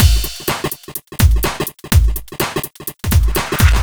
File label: 125 Full, Drum Loops 125bpm; Disco2Disco; Nu Dance Electro